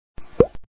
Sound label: drop